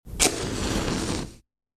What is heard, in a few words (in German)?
streichholz